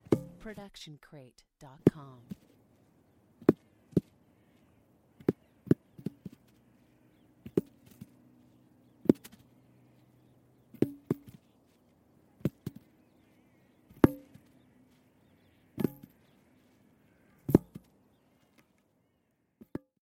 golf club fall on ground mono; soundscrate

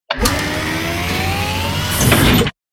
Robotic Movement; SoundsCrate

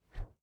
Fighting Woosh; SoundsCrate

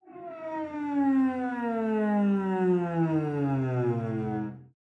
Cello Slide Down; SoundsCrate

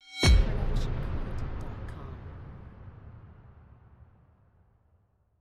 Epic Metal Hit; soundscrate

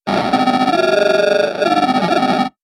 game glitch

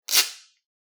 tape pull; soundscrate

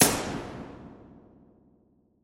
gun shot with reverb; soundscrate

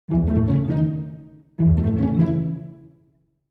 pizzicato phrase

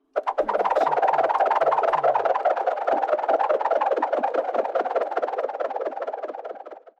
bowstaff spin

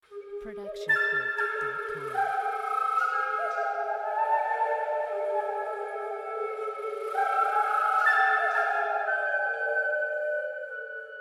haunting flute

